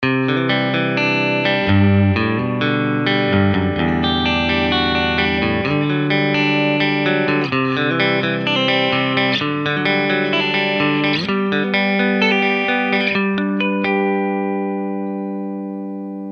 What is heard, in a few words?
Elliot Tonemaster middle, Fender 65 Deluxe Reverb Reissue